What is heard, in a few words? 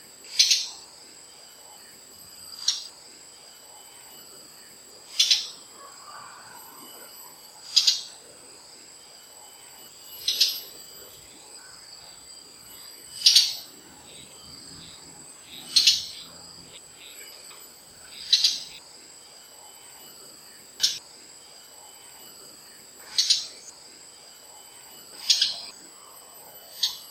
Spectacled Spiderhunter nesting calls; Kledang Sayong Forest Reserve Ipoh Perak Malaysia